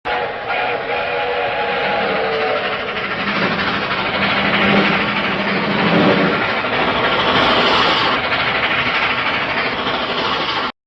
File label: train passes by; disney